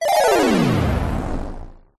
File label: negative game riser sound fx arcade casino kids mobile app